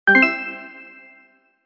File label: winning